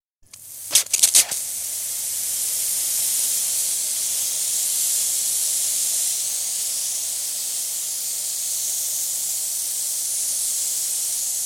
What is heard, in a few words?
Hose pipe, with start & stop; Water; BBC